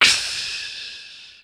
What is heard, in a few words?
Crash; Cymbals